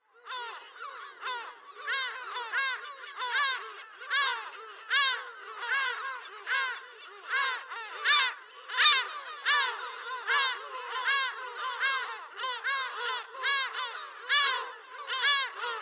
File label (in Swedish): Silltrut